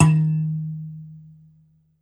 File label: BASS KALIM, Kalimbas; E-MU Producer Series Vol. 7 – Old World Instruments